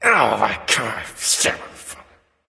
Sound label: grumbling; smite; voicepack; ullr